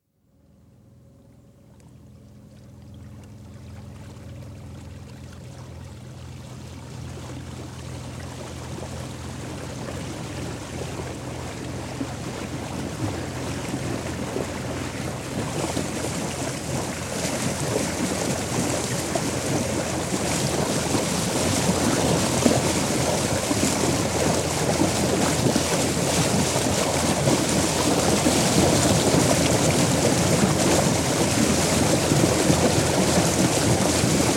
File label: Cabin Cruiser, bow-wave, start to cruising speed, constant run, stop (Recorded on board) (27ft, 50hp diesel engine); Boats; BBC